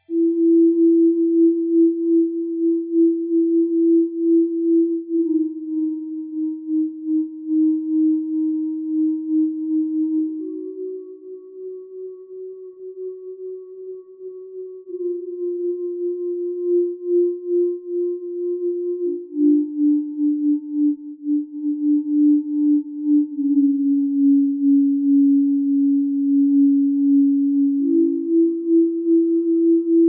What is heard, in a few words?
Laser Tunnel; Space Quest IV; Blue Frogs